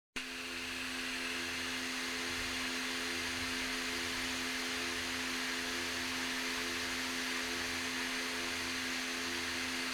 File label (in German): ventilator; kopf-ventilator aliexpress